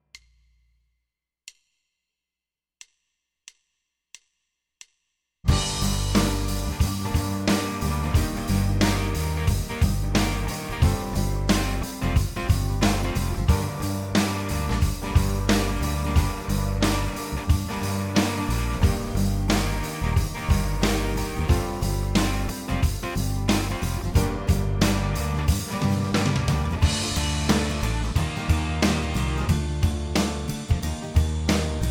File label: Rock Heavy (duet) - play along